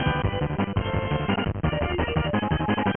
Player Win; Power Spikes

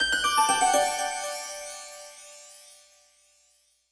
Quest Eruption; Super Mario Party Jamboree

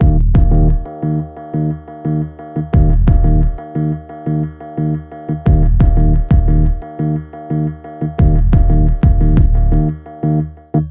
Music Stealth

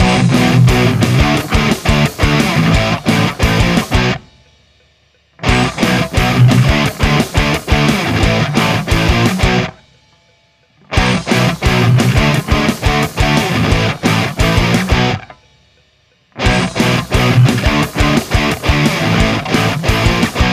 Battle Loop; rogue aces

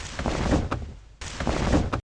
SCROLL

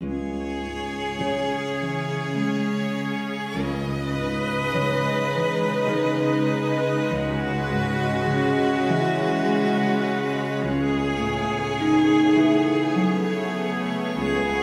Searching the forest; Last Dream World Unknown

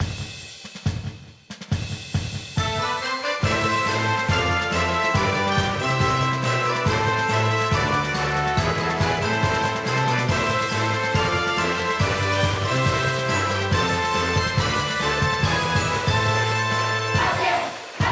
Music Ouen Brassband

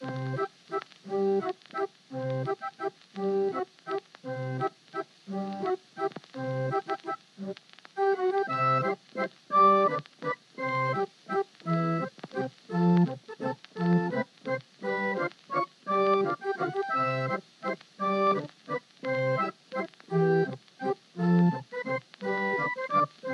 wheel of woo; teardown ps5 windows xbox series xs gamerip 2022; lee woonderland

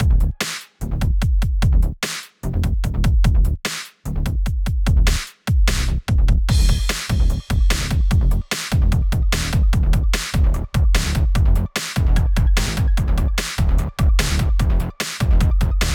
Frantic Mix Stem Loop Layer; Atari

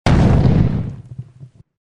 Sfx Enemy Shaman Attack; Mighty Knight 2

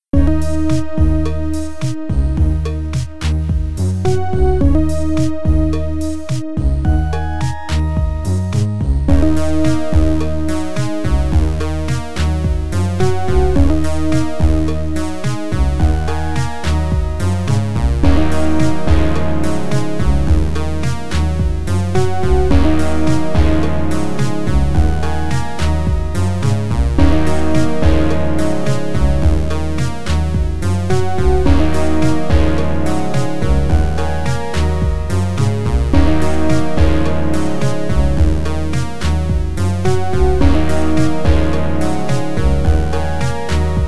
Sound Blaster Version; Zone 66; PC game rip; Desert Heat

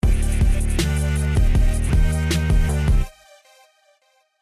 Action

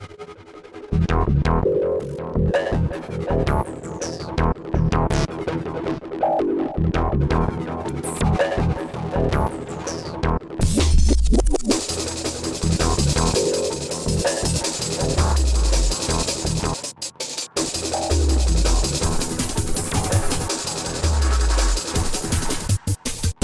Music And Ambience

